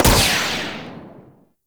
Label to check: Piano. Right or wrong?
Wrong